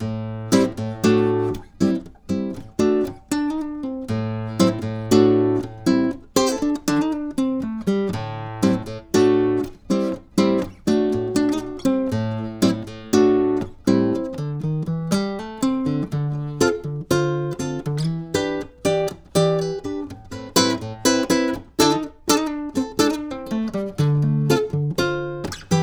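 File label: Acoustic Guitar Mono; MJE-K47H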